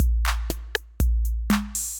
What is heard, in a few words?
Kit 808, Effected Drum Kits; Drum Machines; Ableton; Factory Packs